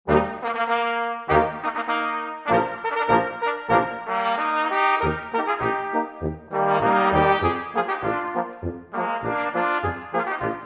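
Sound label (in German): Blechbläserquintett Marsch; Birkenau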